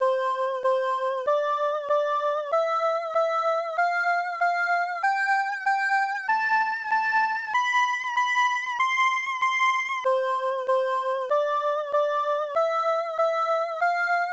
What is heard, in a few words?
Sound Scale Check; konami zr107 arcade; Midnight Run Road Fighter 2